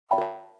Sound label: effect failed, ui; Lenovo K900